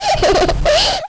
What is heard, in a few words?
headcrab alert; svencoop; sence; mistake coop